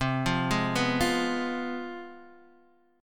C Major 7th Suspended 4th Sharp 5th, down, Guitar, Drop D